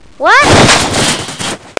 wah; Greatest Stories

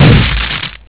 collision

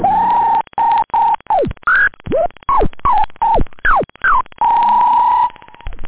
whistle; da capo vol1; effects scratch

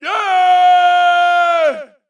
cheer

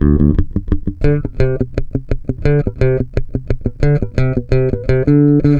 bass

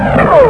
Lose wing; GROTIC 2.0

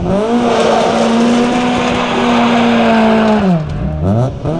REVVING; RallyC

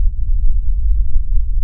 SQUAKE; lander

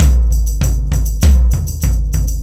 Tribal With Tamb, Drums; ACID MUSIC

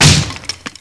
wood fence; Driver

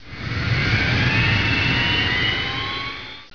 LAUNCH

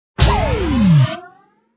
key Sound; chip challenge

